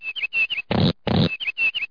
Birds

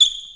Squeek; nbajam

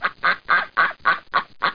geese

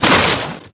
droid hit; DroidWorks demo

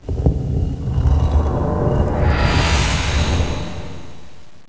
enter

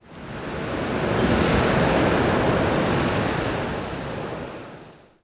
ran wind; DAoC; Toa; Gamestar

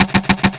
knock; gfactory; impacts

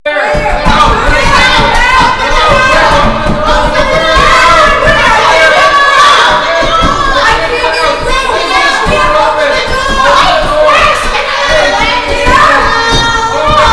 Sound CROWD; Spanish Net

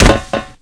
metal hd, body falls; kingpin

